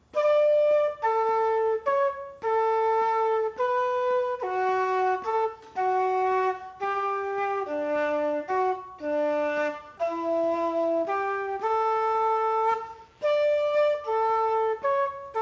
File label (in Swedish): Variant 3 på långa toner (enbart B del)